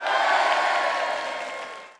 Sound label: snowman crowd; MiniGames